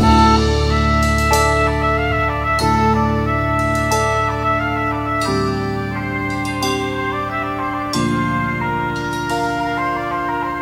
flute, Sad

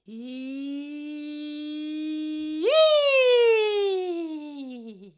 eee cartoon, voice; ooh ahh

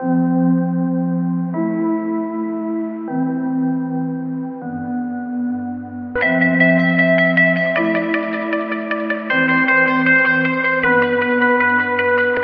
keys and guitar, sad loops

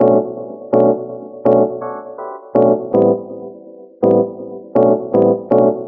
keys, jazz loops

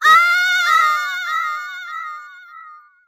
"ah"

